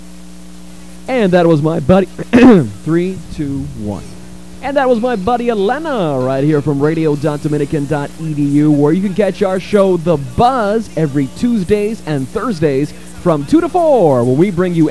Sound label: old vocals